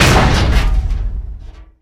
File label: big metal, metal box collide